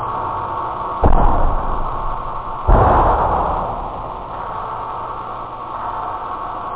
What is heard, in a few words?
bass snare